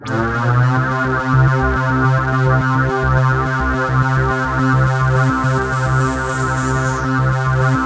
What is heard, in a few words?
C3, SynthLine; 90 s Sample CDs; NiceBeats; SynthLine VOL-2